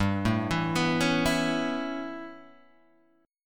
A 9th G, Guitar Standard

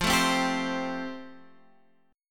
F Major Add 9th; Fadd9 Chord {X 8 7 5 8 8} strummed

